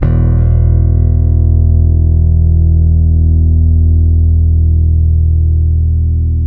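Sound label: finger F 2, 8-string bass; Spectrasonics; Bass Legends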